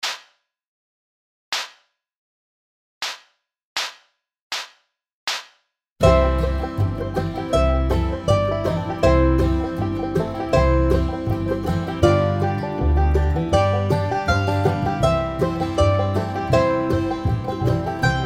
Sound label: slower, Mandolin